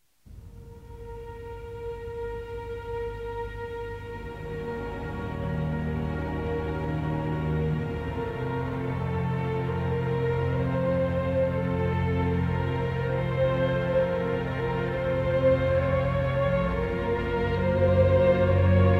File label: Adagio For Strings; 4th-5th classes